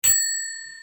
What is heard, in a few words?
bell